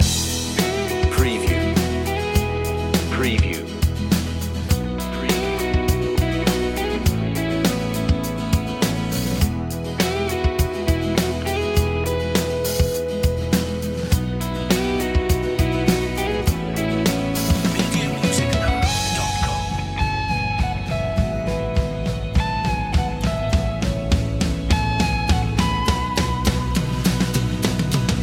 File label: white feeling loop